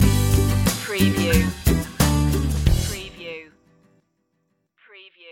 happy journeys stinger

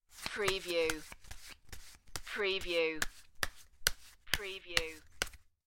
Cards Playing Cards Shuffling

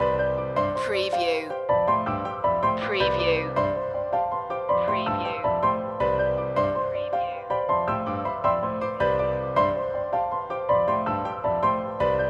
pulse loop

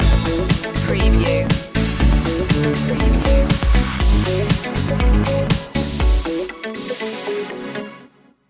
party train stinger

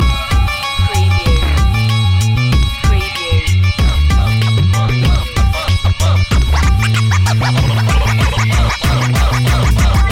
hop to da beat loop

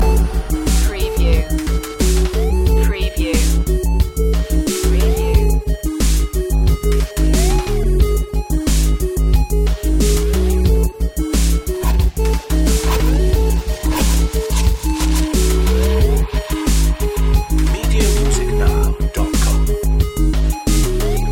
land attack loop

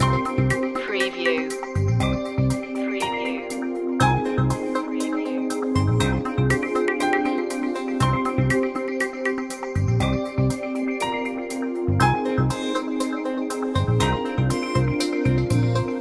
smart beat loop

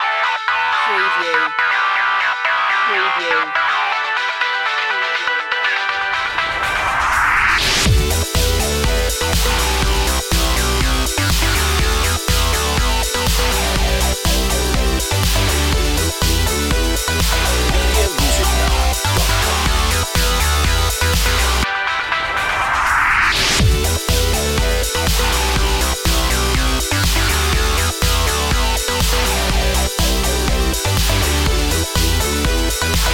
anthem gamer

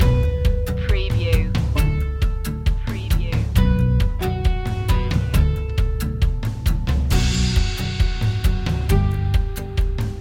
upbeat corporate rock loop

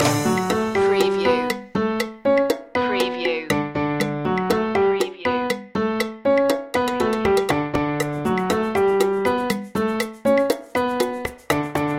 latin jump loop

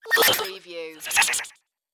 Hit Space Beeps Twice

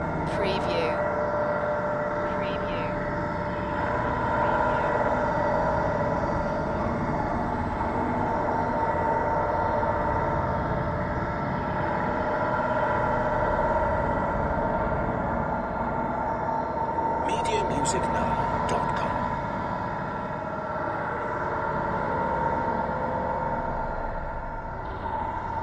static gleam ambience loop

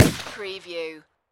Pistol Rhuger 44 Magnum Single Shot Designed

